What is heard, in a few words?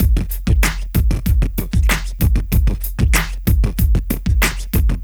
Street Tip, R&B Drums